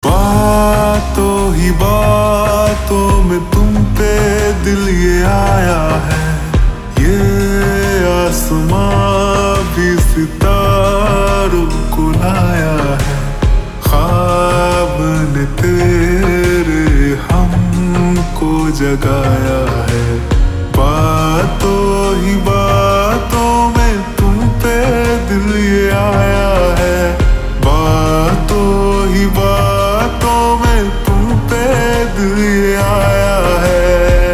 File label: Ringtone Slowed Reverb